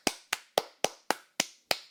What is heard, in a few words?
clap, emotes